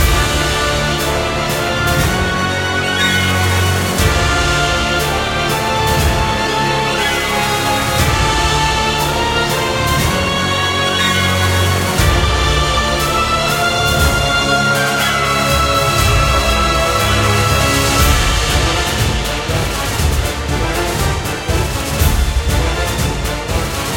Wormhole Boss Mus; Hero Siege; Android

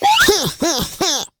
China Miss Sound; Jolly Battle; Android

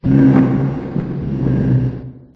Moan; Bonnie; Five Nights at Ronald's

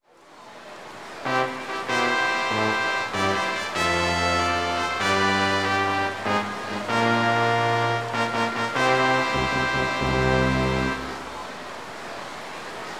Win; thoroughbred breeder sekai seiha hen

